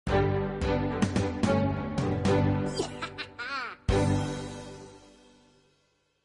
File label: win; jocly